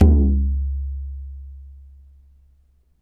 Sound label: African Perc; Spectrasonics; Supreme Beats; African Contemporary; Afro DRMs